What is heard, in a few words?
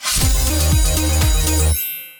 win; Amatic; Fruit Box